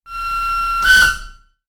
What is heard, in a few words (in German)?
RhB Lokpfeife; Hinweistoene